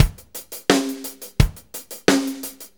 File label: 4-4 Loop, Drum Loops, Full Kit Loops; Drum Tools